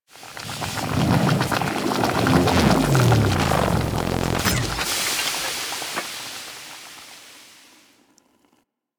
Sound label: Venus Research Facil Vat Cooker, Ambience, Props; Objective Rooms; Props One Offs